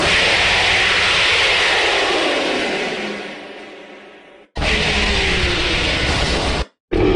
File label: roar; kyubbi